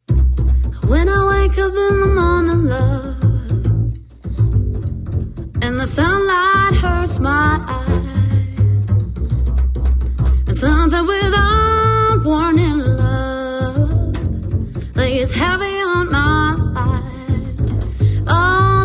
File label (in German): Sax Quartett